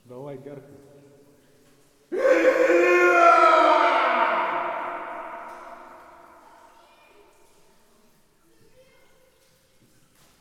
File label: Screams Of Agony In The Cave